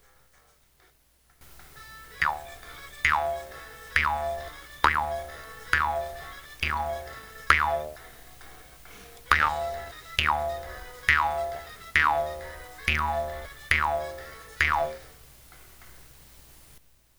jaw harp

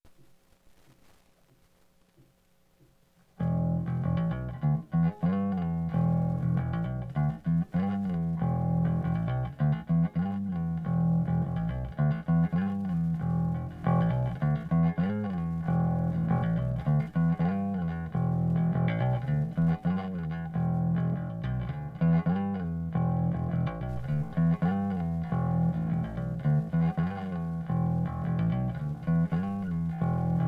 bass

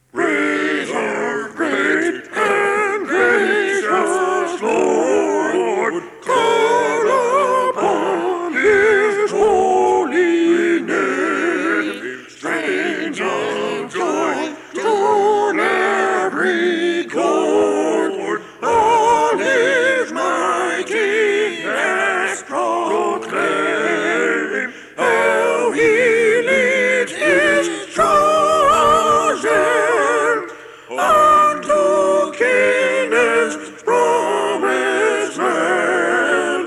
A Capella

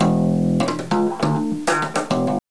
slap

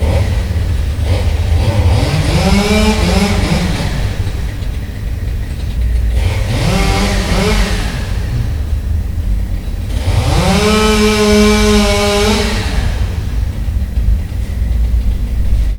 Chainsaw

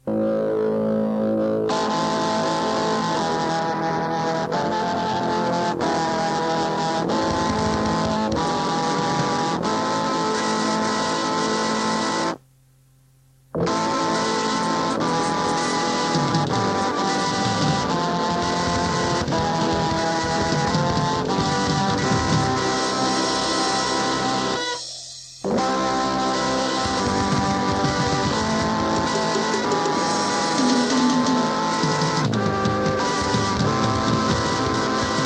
Organ Grinder

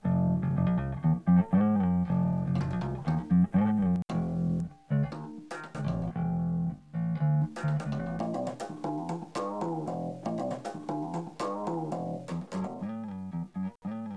bass riffs

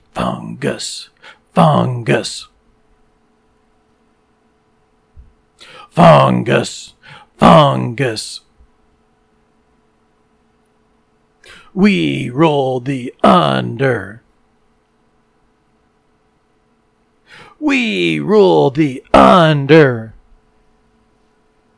vocal snippets

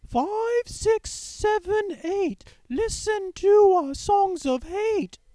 falsetto

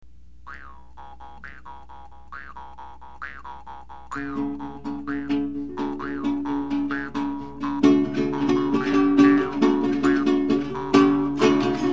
Jam

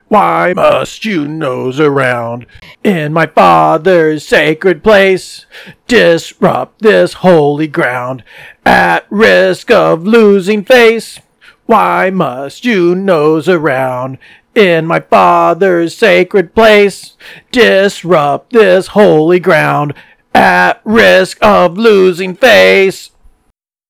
hack vocals

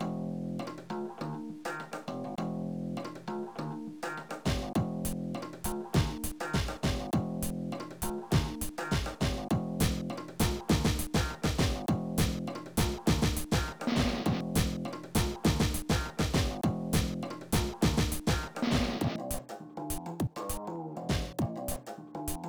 bass party

